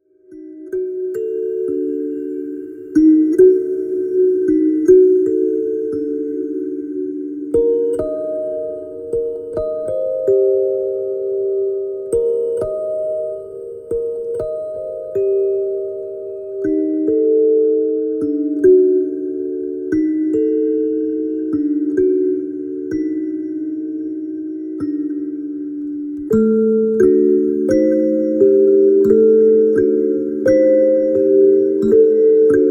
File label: Sounds of my Hands